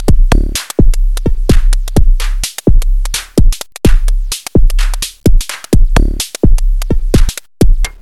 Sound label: volca drum mono